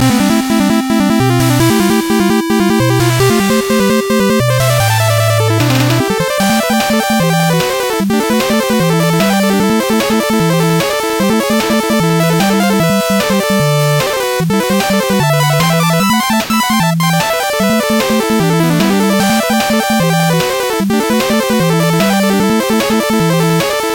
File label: battle; rockman7